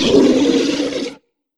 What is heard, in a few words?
Death Monster; Legend of Keepers (gamerip) (2019)